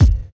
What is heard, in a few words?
1990s thick hip hop kickdrum sound g key, Royality free kick single hit tuned to